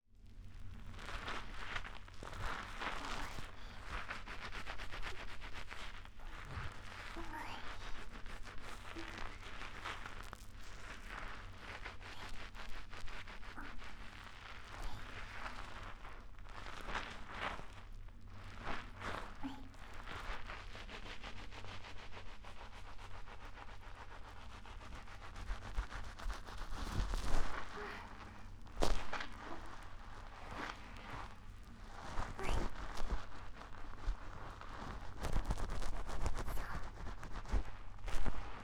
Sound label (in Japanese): シャンプー ループ音声